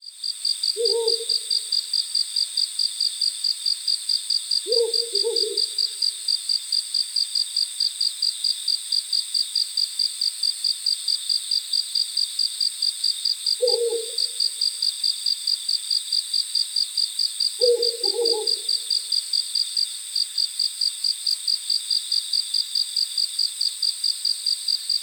owl forest

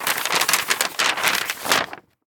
opening letter

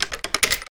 inserting key into wooden door

